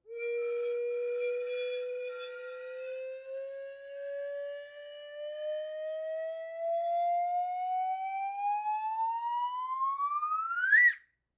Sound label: slide whistle up